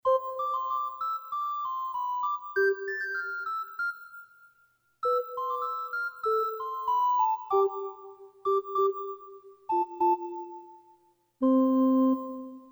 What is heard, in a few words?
Ocarina